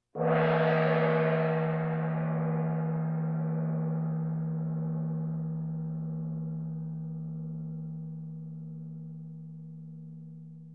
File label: Percussion Bongos Tympani rolls Gongs Drums